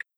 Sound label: taillight tick